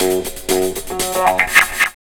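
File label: GTRWAH, guitar wah; E-Lab; X Static Goldmine 1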